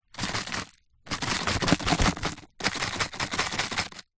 food potato chip crumbs shake in large packet; zapsplat

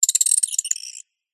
squeaky metal Designed Alien Bird; PMSFX

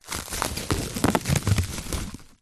household cling film wrap un roll

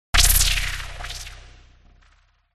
fm stab laser stutter; sound design

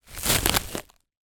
household cling film movement; zapsplat

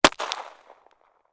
weapon gun ruger mark iii; still north media

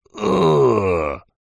human male adult says uggh; zapsplat

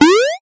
game sound classic jump

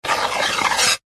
sport snooker cue slide table edge; zapsplat

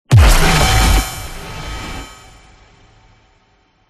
DESIGNED Cyberpunk; PMSFX

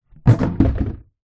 household cool box plastic knock over

